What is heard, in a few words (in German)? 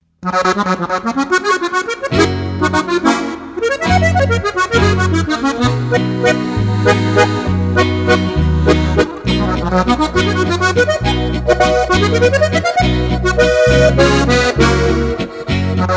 Steirische Harmonika Strasser, Walzer fur Harmonica; Staalkaart